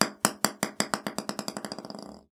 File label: Golf Ball Bounce; Balls